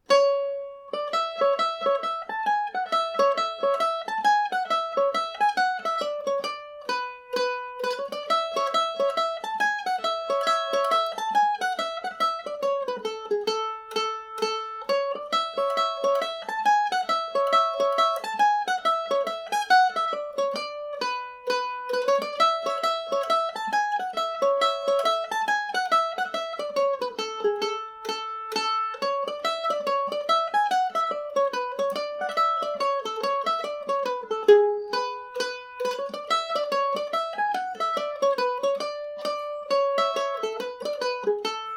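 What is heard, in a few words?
lamplighters hornpipe A major, mandolin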